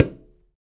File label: Talk Drums; Spectrasonics; Supreme Beats; African Contemporary